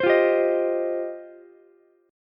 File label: G 7th Suspended 4th Sharp 5th, Ukulele; G7sus4#5 strummed